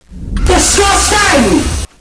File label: disgust ang